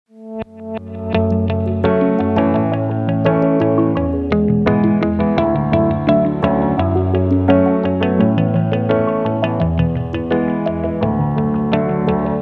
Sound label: Chilled Ambient